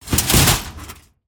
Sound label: Impacts; Just Impacts; Extension I; soundbits